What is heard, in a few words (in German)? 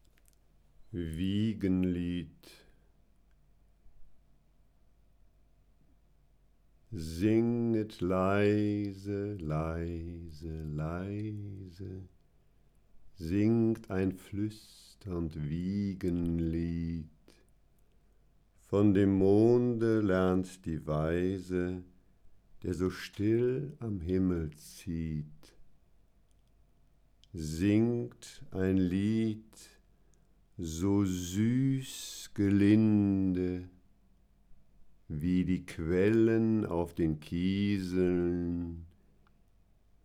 Wiegenlied